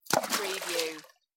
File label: Rock splash